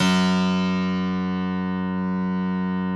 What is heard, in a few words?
F, Harpsichord; Harpsichord -ped; F0; Total Piano